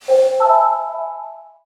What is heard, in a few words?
aula intro sting